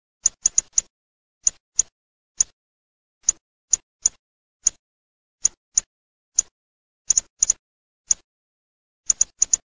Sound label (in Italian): frosone